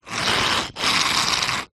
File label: cuttlebone scrape ground; zapsplat